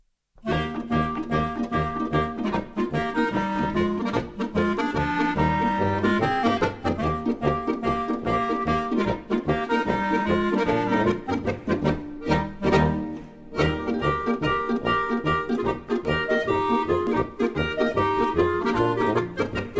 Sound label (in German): Polka